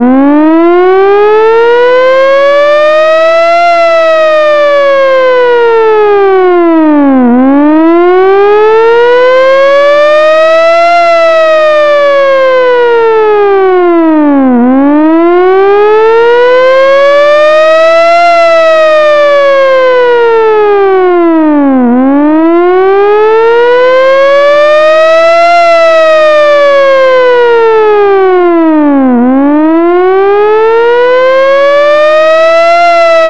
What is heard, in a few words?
vintage hi lo siren